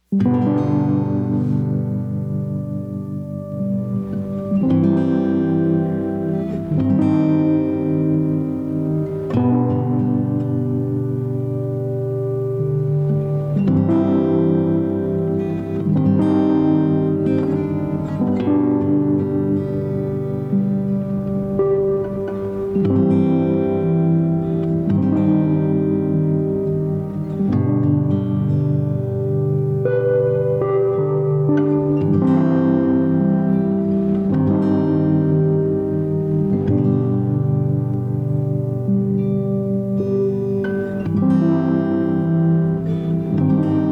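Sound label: Reflecting with a Guitar; rock indie pop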